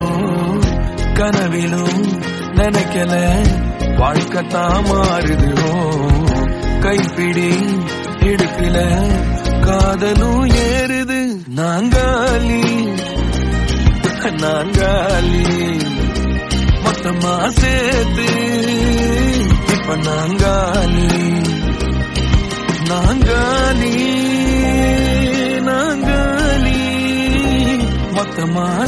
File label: Movie Song Ringtone; Good Night